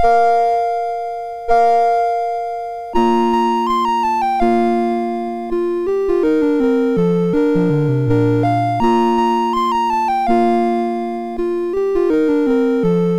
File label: Ding Dong, MOS6581R3